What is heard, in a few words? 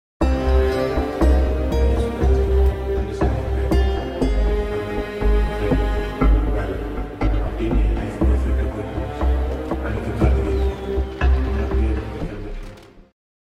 Narration Continues; Vai Raja Vai; Tamil